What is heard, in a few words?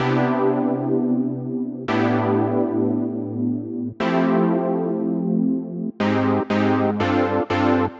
Chord Synth, 120bpm; Zero-G; Nu Disco; Nicengroovey C